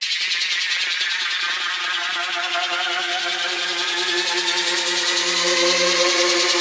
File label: Noise FX; SFX